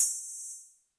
ride sample B key, drum ride tuned to the B note. Loudest frequency: 10433Hz